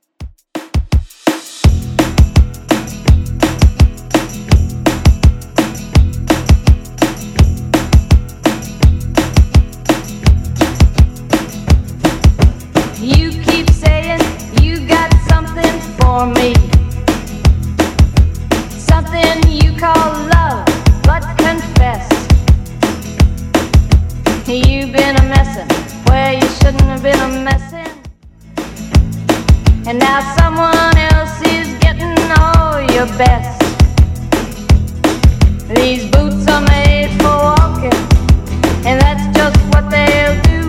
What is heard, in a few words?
60s Real Drum 167bpm